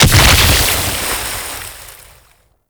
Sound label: Cannon Explode; Pirate; Warframe